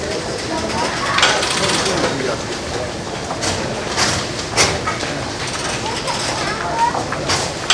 subway, crowd; AKAI S6000 CD-ROM - Volume 6; Back Ground

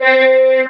talk box choir a

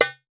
Tabla Tak; Roland BK-5; Percussion

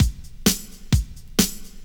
drum 130 straight; Techno Trance Essentials

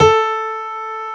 PIANO, Roland

